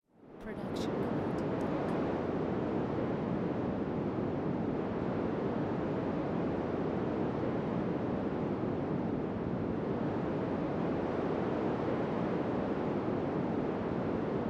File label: wind in hills; soundscrate